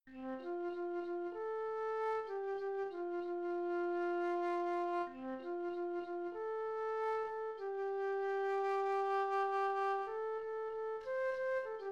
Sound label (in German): Flöte Melodie F